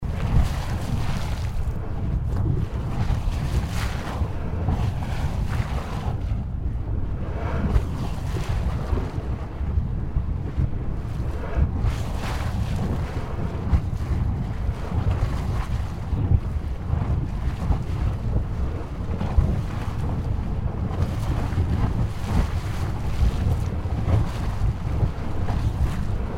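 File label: Norris Geyser Basin, Yellowstone; Veteran; MKH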